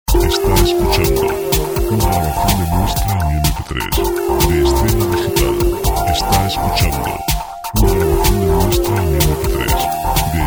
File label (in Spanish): 125 informativos